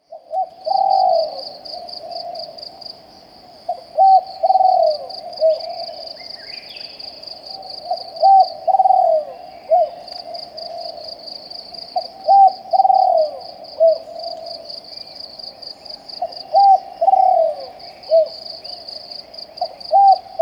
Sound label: eastern spotted dove; hupphatad